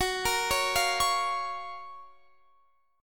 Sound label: F sharp Major 7th, Guitar, Standard; x,21,20,18,18,21; arpeggiated; capo=7